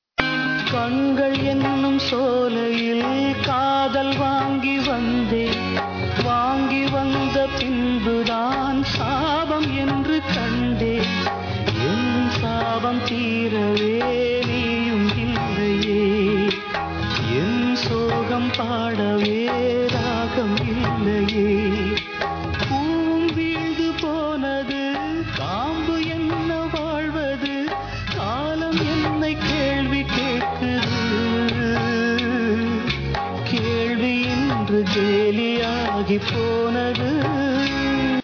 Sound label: tamil sad love feeling ringtone